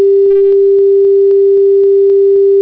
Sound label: Tone Bell